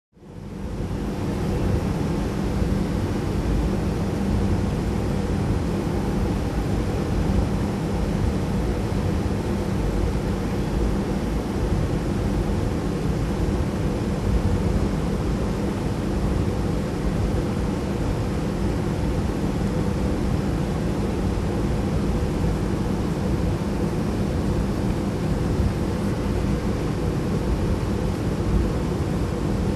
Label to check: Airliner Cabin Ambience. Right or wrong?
Right